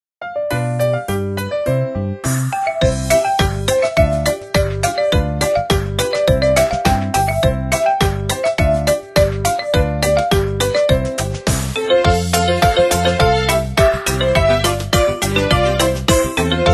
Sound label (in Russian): Детская полька